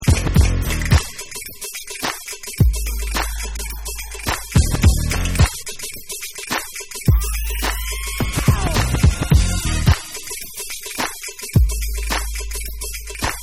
Instrumental

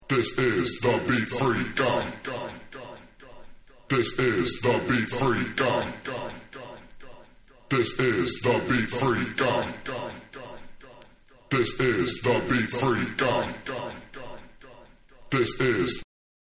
BEAT FREAKER Acappella

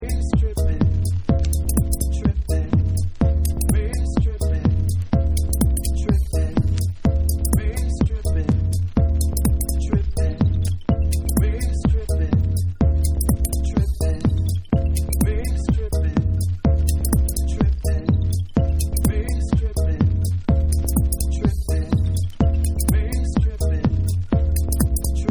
BASS

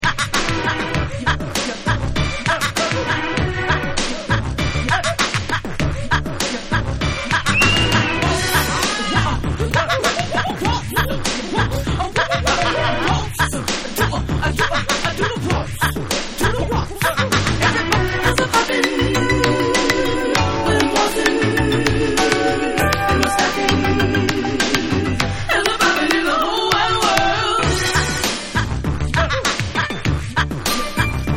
Rap

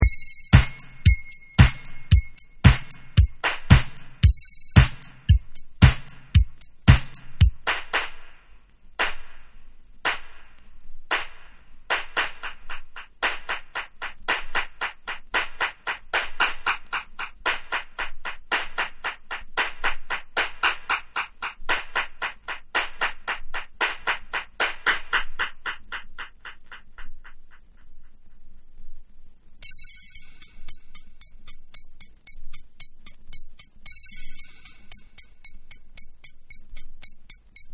114 BPM

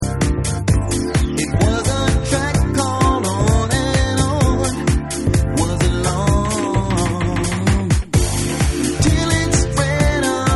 Groove Vocal

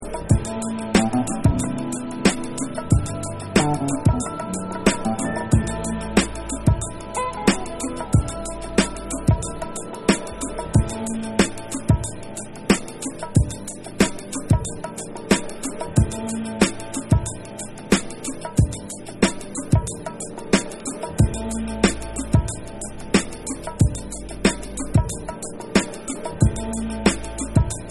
Instrumental

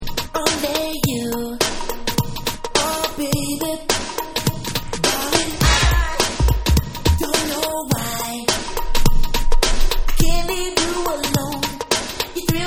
Dub

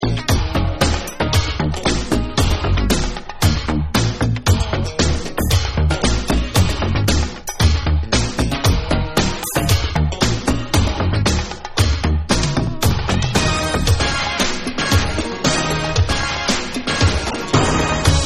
Instrumental

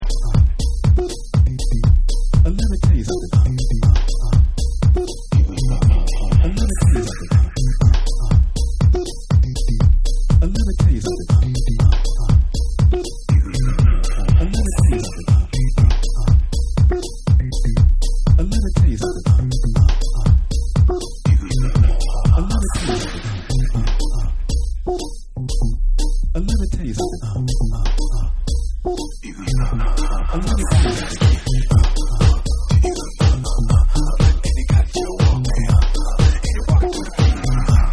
IM MY OWN WALKMAN Bass Boots Remix

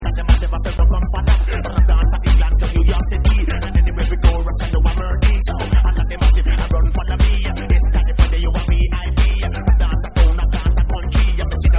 Techno Toast Mix

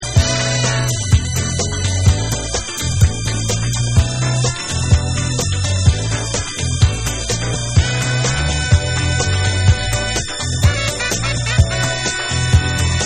WOOD BRASS STEEL Funkanova

